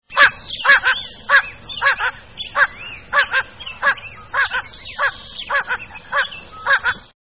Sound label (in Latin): Anas discors; voice